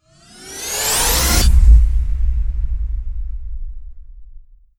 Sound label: rise to hit freeze